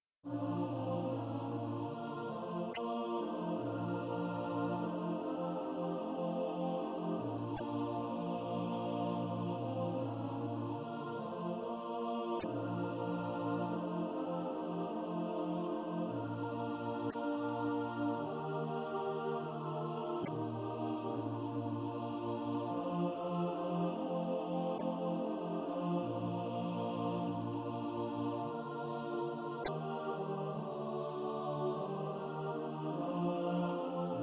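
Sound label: alto, SATB Practice Music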